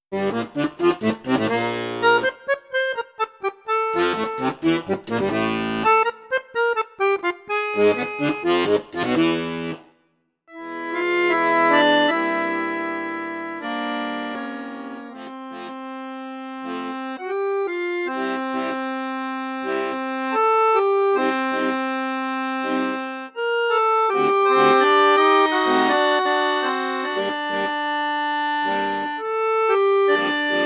registre basson flute juste, accordéon; fratelli crosio

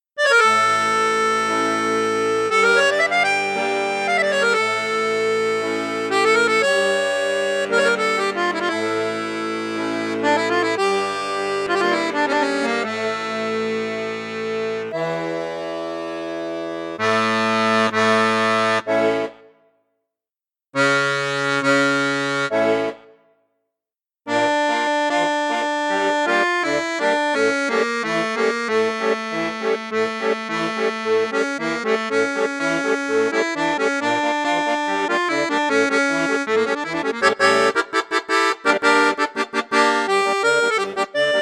registre basson flute juste, accordéon; piermaria convertor

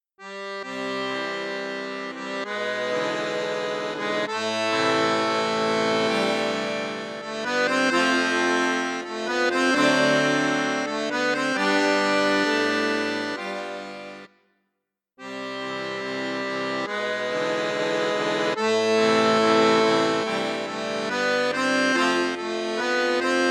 registre basson flute juste, accordeon; piermaria 324

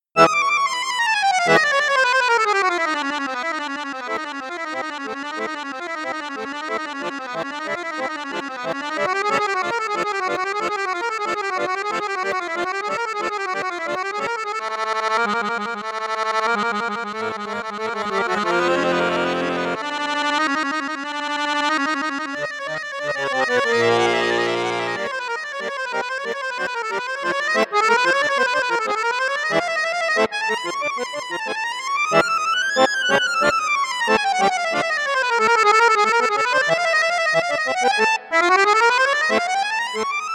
registre 8 8 4, accordeon; borsini bayan

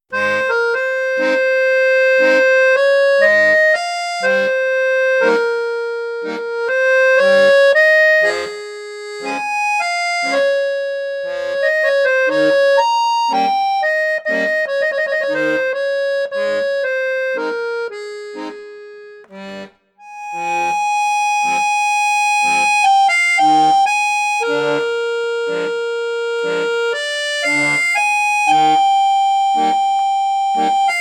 registre basson, accordéon; crucianelli super king

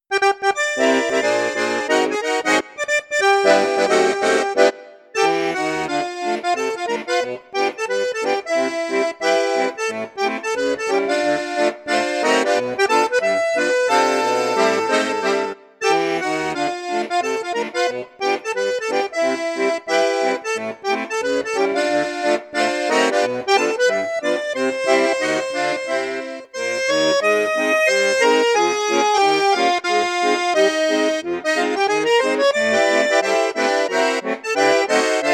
registre basson flute juste flute haute, accordeon; perle d'or

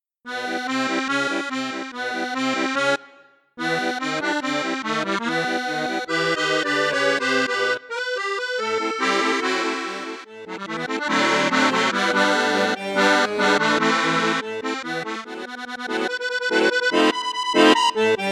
registre flute juste flute haute, accordeon; piermaria 324

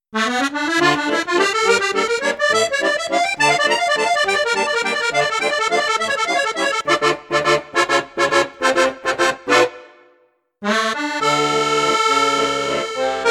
registre flute juste flute haute flute basse, accordéon; piermaria 318 l

